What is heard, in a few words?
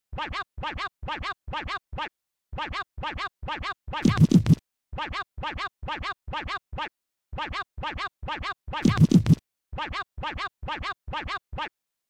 Scratch